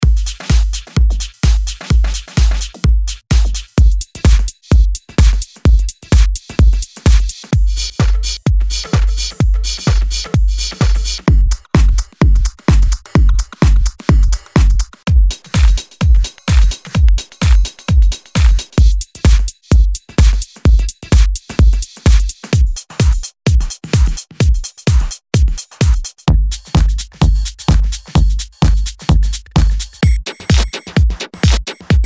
Drums Only

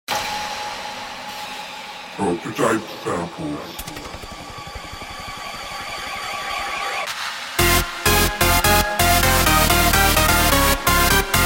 FUTURE ELECTRO FESTIVAL

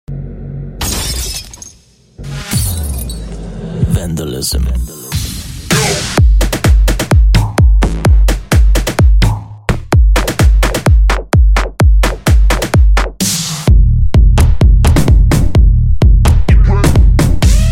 percussionism progressive

